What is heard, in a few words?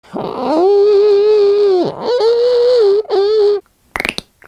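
Electric Guitar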